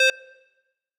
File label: beep